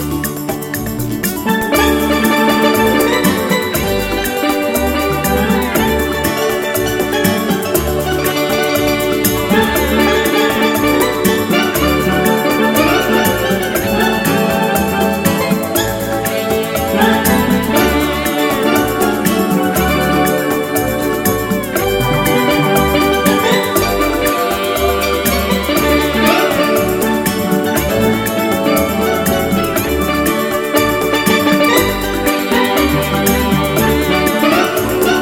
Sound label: Beautiful Latin Music